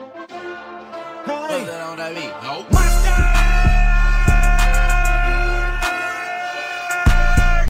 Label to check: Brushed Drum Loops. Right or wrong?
Wrong